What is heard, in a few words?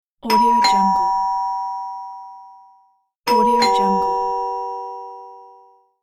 door bell ding dong